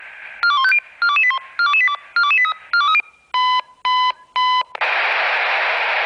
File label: Alarm pageboy